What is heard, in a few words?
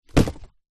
foley cardboard drop lightly